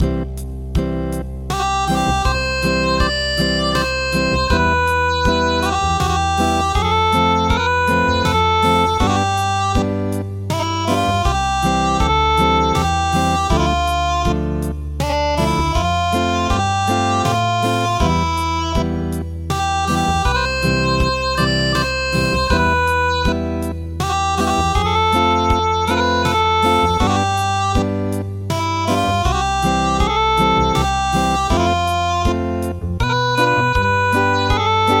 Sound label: Acoustic, midi; Serenade on the mountain